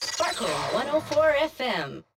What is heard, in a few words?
Radio 80s Jingle; Gangstar Miami Vindication; Android; macOS